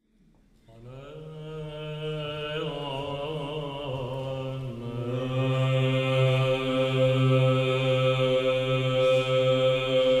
Christos Anesti, Bizantyjski Chór; ton 1 plagalny; Mel. św. Góry Atos; Domestikoi of Drama; Drama (Grecja)

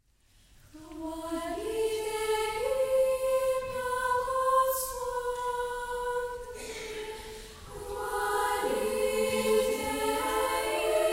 Dziecięcy Chór; Chwalitie Imia Gospodnie; Dobri Christow; Radia i TV Litwy; Wilno (Litwa)

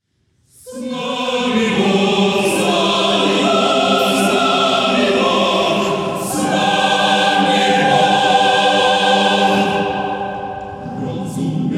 Akademicki Chór; S NAMI BOH; Wasilij Zinowiew; Państwowego Radia i Telewizji Białorusi; Mińsk

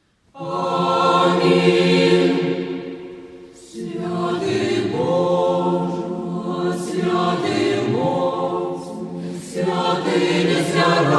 Chór; Światy Boża; Mikoła Kulikowicz; Wzorcowy; Raduga; Dziecięcej Szkoły Chóralnej

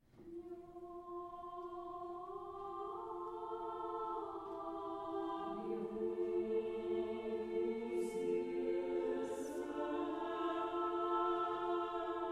Hymn; Sw. Daniiła

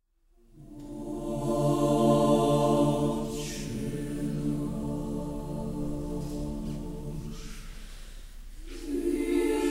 Chór; Otcze nasz; Anatolij Kisielow; Państw. Uniwersytetu Lingwistycznego Cantus Juventae; Mińsk (Białoruś)

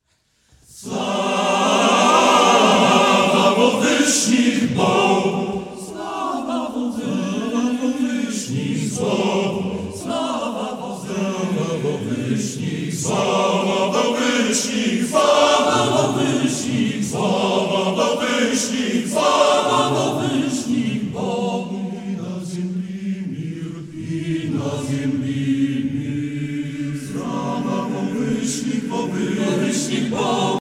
Chór Męski; SŁAWA W WYSZNICH BOGU; Dymitr Bortniański; Słowiki 60; Szczecin

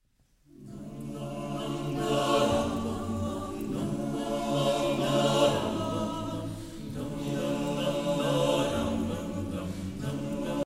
Chór; Dni moi; Irina Denisowa; MDK; Białystok

